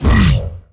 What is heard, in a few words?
Phased Pulse